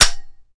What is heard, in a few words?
no ammo